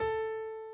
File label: key